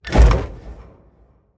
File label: armor; smite; chaos titan